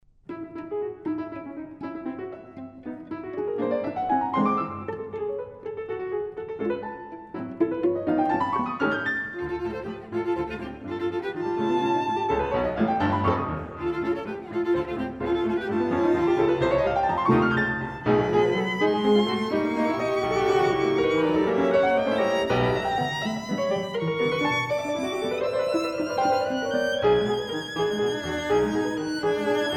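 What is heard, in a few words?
piano trio; ollone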